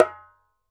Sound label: ASHIKO, African Drum